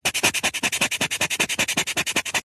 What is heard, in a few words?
foley abrasive foam rub clean or sand concrete fast